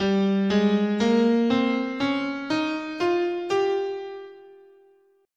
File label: G Locrian, scale